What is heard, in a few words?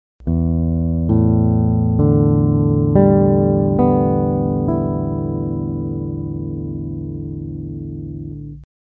tune all, guitar